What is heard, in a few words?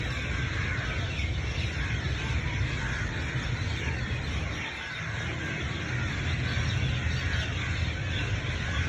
flock birds; پرواز دسته جمعی پرندگان